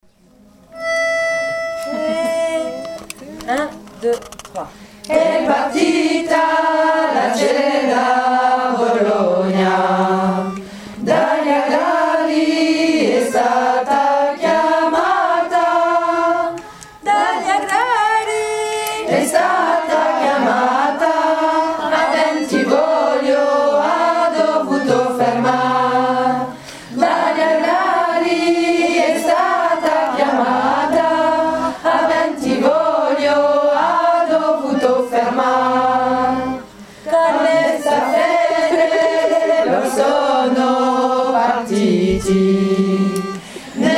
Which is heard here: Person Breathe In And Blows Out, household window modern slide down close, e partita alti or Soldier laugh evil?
e partita alti